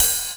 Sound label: GROOVE RIDE, Drums Dance & Groove; USB Soundscan vol.11; AKAI; GROOV KIT